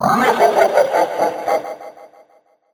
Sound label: jackolantern laugh; mission folder that appears when you have taken too long in a ghost house